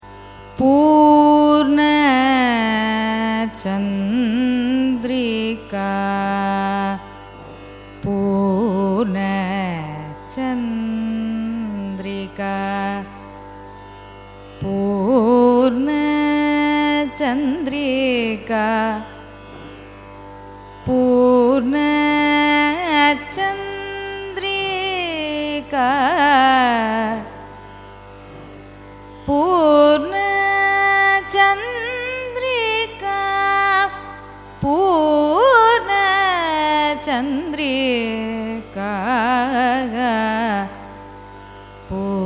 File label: raga poornachandrika signature, carnatic music